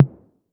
808 TOMS; Wizoo - Platinum 24 Electronic

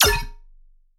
Riddim Zap

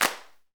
PRC XCLAP, Hand Claps; Club-50 - Foundations Roland; Snaps

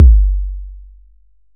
Bassdrum

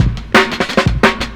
175, Breaks; Drum&Bass; EZ Rollers

